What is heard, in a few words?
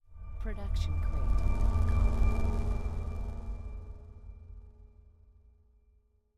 scifi tone